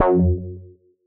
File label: Bass F#2; radiosonics; Policebox Bass